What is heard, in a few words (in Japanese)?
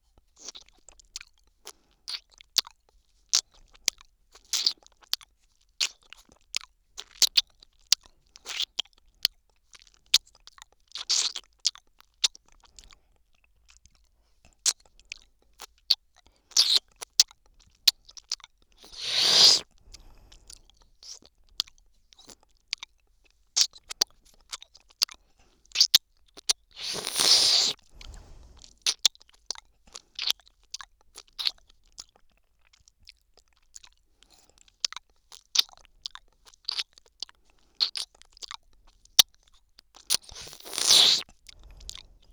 左耳 アイス舐め